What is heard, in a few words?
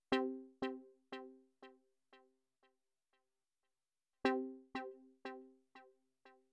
Trance